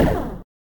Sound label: shoot